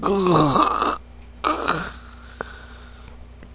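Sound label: female dead; NWNDS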